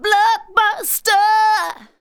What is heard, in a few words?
vox, Techno Trance; Ueberschall